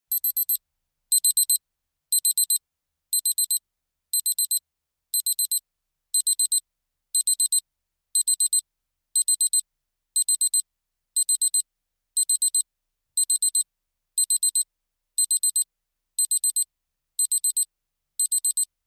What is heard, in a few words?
Nikon FG Sonic Warnin, singles beeps